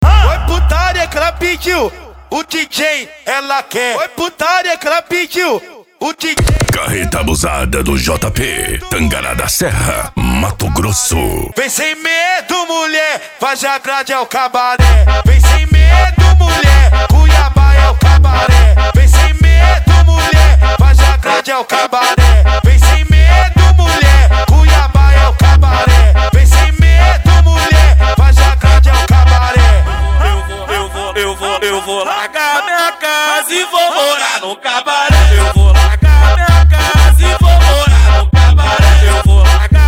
funk mega bass

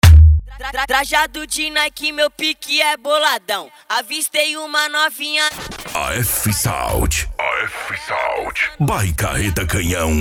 esp funk automotivo; afsound

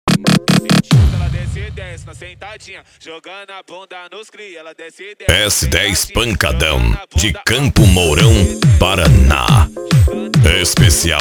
pancadao funk

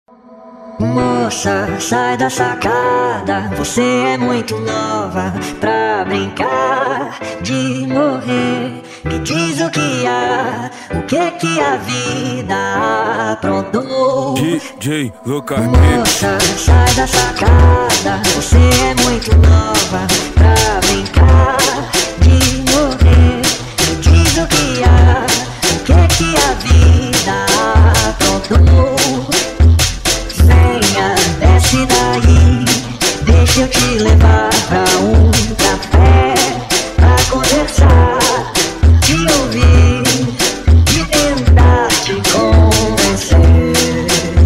funk house; os tubaroes

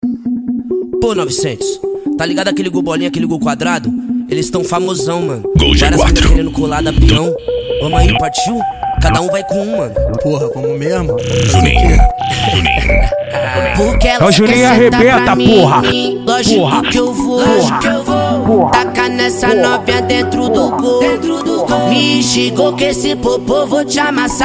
cd funk automotivo

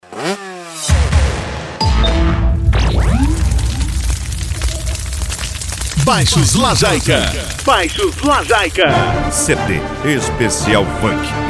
funk e sertanejo; baixos lajaika

